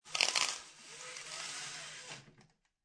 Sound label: Household, Blinds, Plastic, Blinds Being Pulled Up To Reveal Window; Bedroom Sound Effects